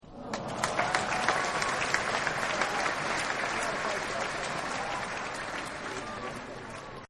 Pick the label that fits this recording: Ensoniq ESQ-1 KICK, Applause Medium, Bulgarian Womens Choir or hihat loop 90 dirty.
Applause Medium